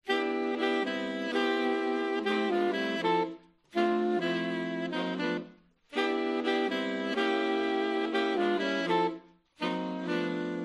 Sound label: Saxes